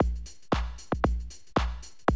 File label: Classic Drum Loops